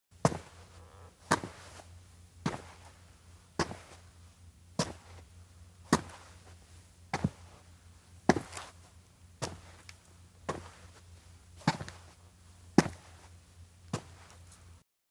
steps outdoor town walk; anemoi

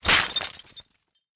metal pops; anemoi

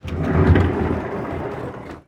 door open home; anemoi

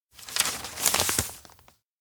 pin paper thumbtack; anemoi

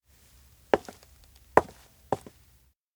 steps indoor walk in back; meguriai; fumiya; anemoi パッケージ版 1.1

